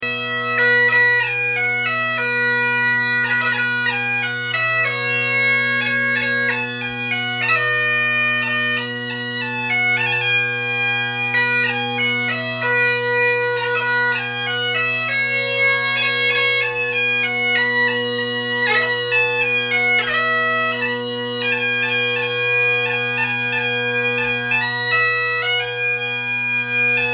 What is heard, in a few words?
Jingle Bells, bagpiper; Yuletide Carol; Great Highland Bagpipes